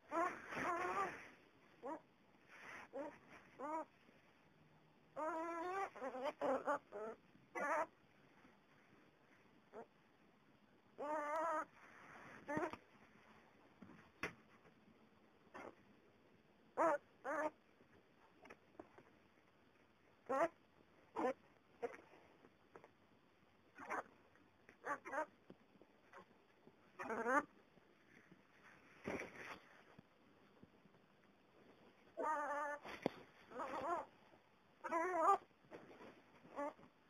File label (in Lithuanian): verkimas